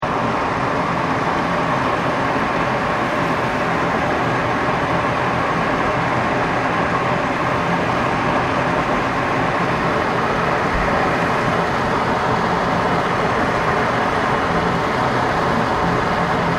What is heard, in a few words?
PUMPING STATION HOT SPRING EXHAUST, Japanese hot spring pumping system; Machinery; Machines